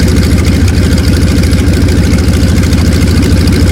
yak side, engines; War Thunder

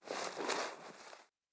pumpkin plant gib; Rust

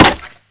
impact wood; Modern Warfare

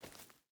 footstep burlap dirt walk; Rust

